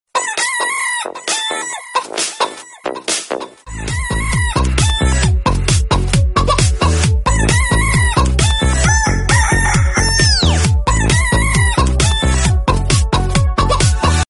despertador da galinha

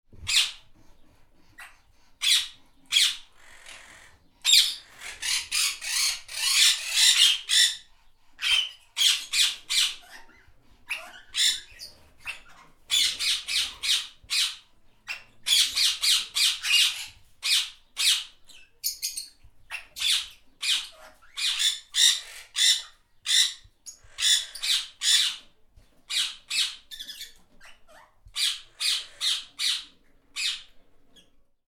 papagaio efeito sonoro

